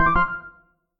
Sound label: pause start; tetrio